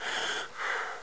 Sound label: Breath Medium; Player; HereticII-Demo